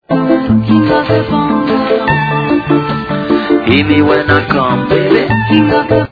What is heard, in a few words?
bongobong